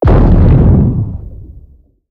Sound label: damage hits explosions